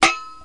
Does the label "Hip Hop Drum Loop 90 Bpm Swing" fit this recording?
No